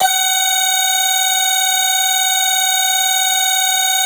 synth clav

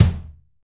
Rock Kick; Roland BK-5

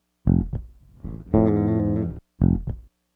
Raw Funk Bass; Sonic Foundry (Sony Creative Software); What it Is! 70s Analog Funk

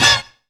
KNOCKOUT HIT; Zero-G; Funky Elements

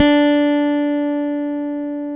CHAP STICK, D 5; East Collexion - Bass S3000

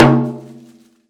Prepared Drums

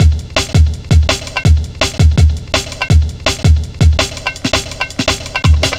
Drum Loops, 165.5, Jungle; Zero-G Jungle Warfare 1, 2 & 3

